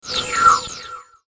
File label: Mouseover Whoosh Phase Click; Multimedia; Blastwave